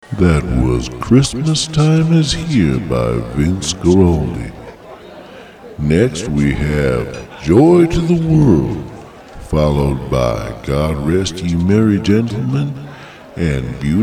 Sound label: christmas jazz